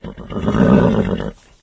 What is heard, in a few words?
horse, animal; ambient; Source Engine; cstrike